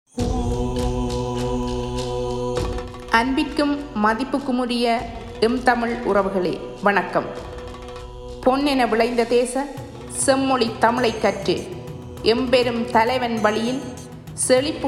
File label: Vocal